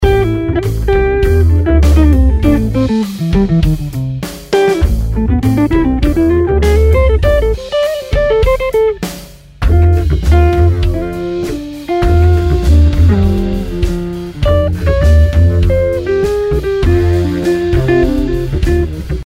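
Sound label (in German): Gitarre, Preset „2 Guitars is Better then 1“; Waves Abbey Road Studios Reel ADT; Ueberschall Guitar; Plug-in Variante „Reel ADT2V Stereo“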